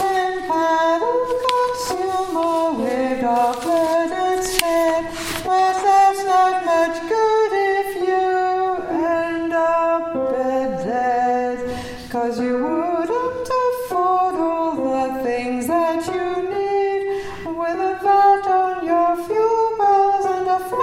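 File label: Soprano Verse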